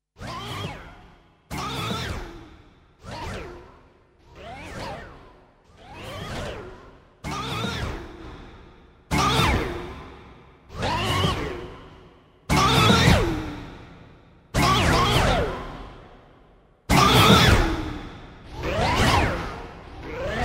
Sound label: scratch